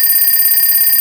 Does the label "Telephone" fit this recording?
Yes